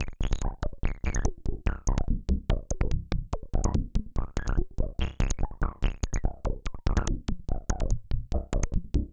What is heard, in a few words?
C1, Synth; 90 s Sample CDs; NiceBeats; SynthLine VOL-21